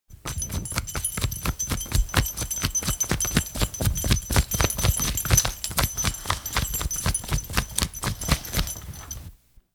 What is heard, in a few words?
Rabid Dog Barking; Halloween Sound Effects